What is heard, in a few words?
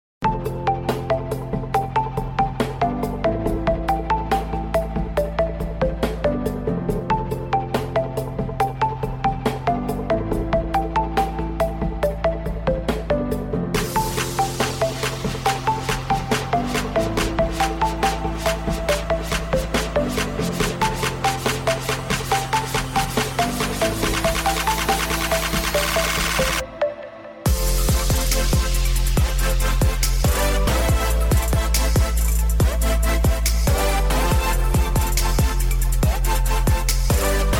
Chill Trap